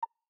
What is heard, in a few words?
hover